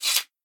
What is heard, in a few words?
recall sheathe sword; Smite; Susano